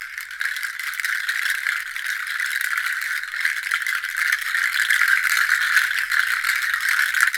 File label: WATER, Water Perc, H2O Castanet; Roland L-CD701